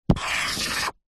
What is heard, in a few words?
office marker large draw line whiteboard